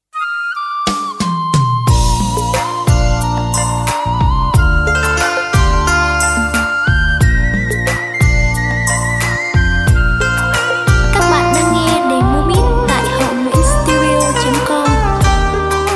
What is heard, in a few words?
beat, playback